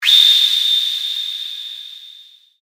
シャキン 輝き

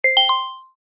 場面切り替え ベル 遅め

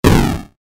爆発 レトロ

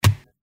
矢・木に刺さる

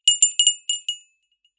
小さいベルの音; 場面切り替えや心理描写; 演出効果音

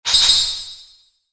ランク レア度2 モノラル

